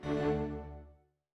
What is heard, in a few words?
tada; Windows; Glass